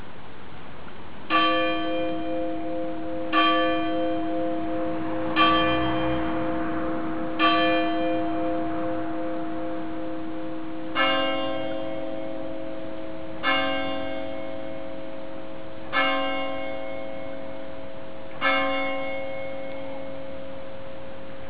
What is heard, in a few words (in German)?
Glocken aussen